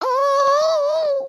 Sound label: need to pee again